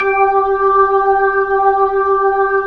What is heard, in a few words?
B-3 G 5, Jazz; Keyboards of The 60's and 70's